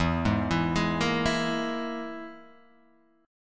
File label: E Major Flat 5th E down Guitar Half Step Down